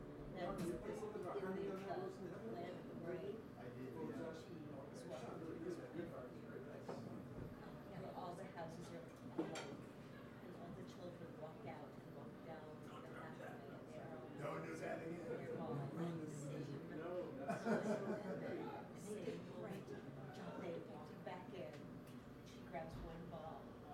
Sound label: Soundscape; burlington soundscapes